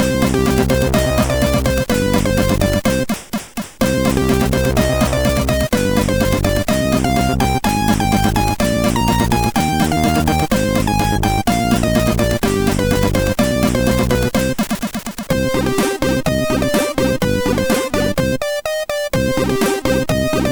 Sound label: Fanfare, MOS6581R4